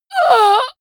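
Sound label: female combat hit hard, screams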